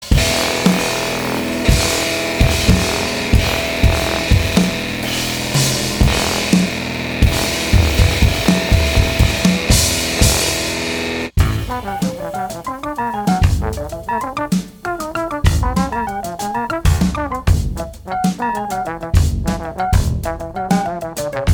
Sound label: pianer